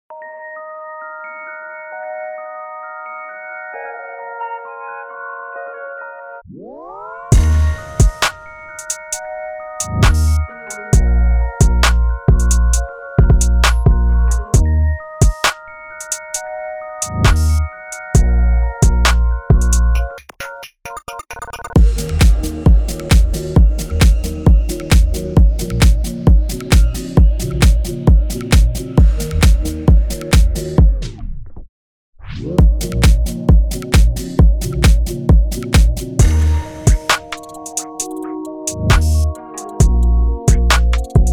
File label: INST, 133BPM Ebm